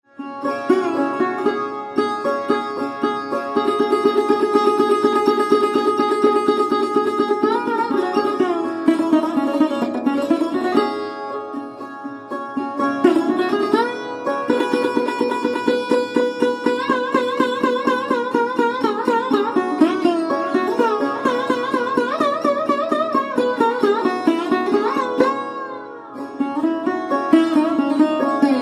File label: sarod